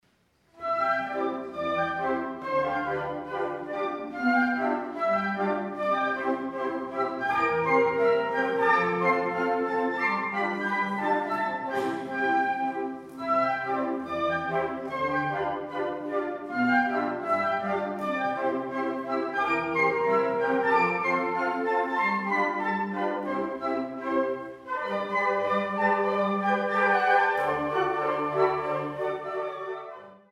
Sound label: Annen Polka